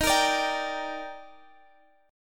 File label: D sharp Major 7th, Guitar, Open F; D#M7; strummed; x,x,15,17,17,14; chord shape